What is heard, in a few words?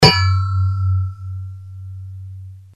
sword deflect stone; conan